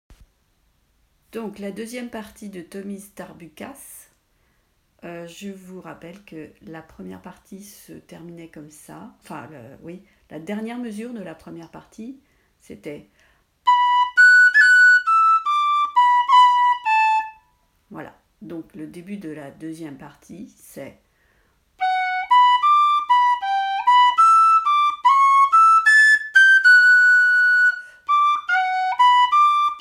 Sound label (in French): lent; Tommys Tarbukas